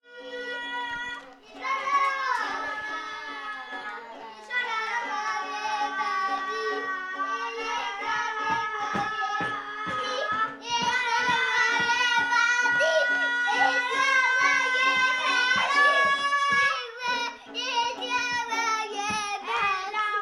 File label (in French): chantent